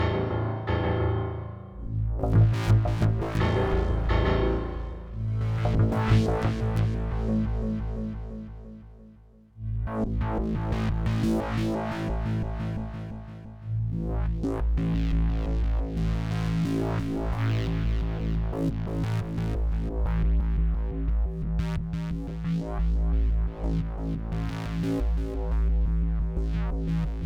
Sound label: Music Boss Battle Layer2 Syn; Plants vs. Zombies Battle for Neighborville; MushroomBoss